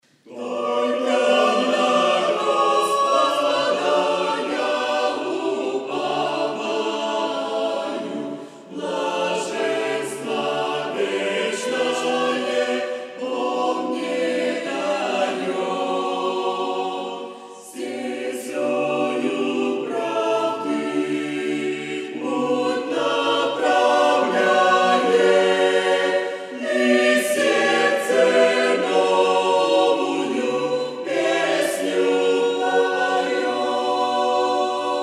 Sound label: Choir; Церковь «Путь ко Спасению»